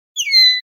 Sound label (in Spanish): queja; tio; pardillo